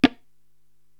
Tabla Mute, Korg TR-Rack

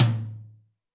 tom, Zoom MRT-3B

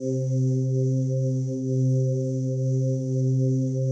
DIGI-PAD

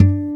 D4, ACSTC.G; AKAI S-Series CD-ROM Sound Library VOL-2

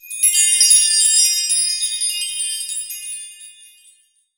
Ethnic Perc, Percussion; Dance Synths

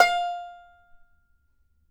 Banjo F5 L; Sampleheads; Whole Lotta Country 1 & 2

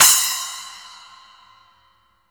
SPLASH C, Drumworks; Sampleheads; New York City Drumworks VOL-1

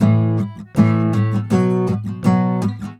EM GTR, Celtic Flavours

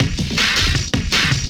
Loop, Drum & Bass, 160 BPM

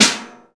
TRASH, INDUSTRIAL; Roland - LA Composer vol. 1

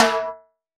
High Timbale, Roland BK-5; Percussion

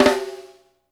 FLAM, SNARES; Sampleheads; New York City Drumworks VOL-1

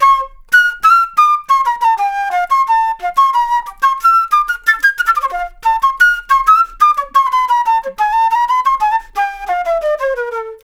Flute Solo, Jazz; Sonic Foundry (Sony Creative Software)

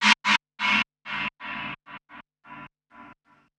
Gated Cymbal; musicradar

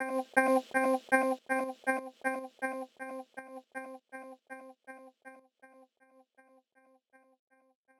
Gtr Fx Loop 120, Loungin House; Music-Fx Loops 120; Equipped Music

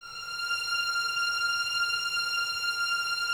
VNS F 4, Violins, String Ensembles; Miroslav Vitous

